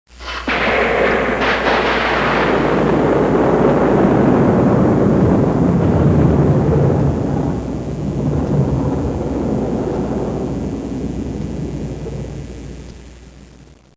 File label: Lightning Crack